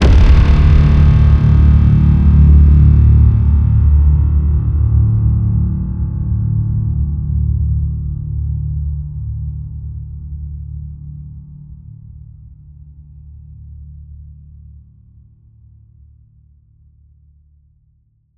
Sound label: HIT SciFi, Cinematic Hits Designed